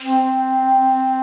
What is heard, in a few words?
Piccolo C4; Star Trek JR Audio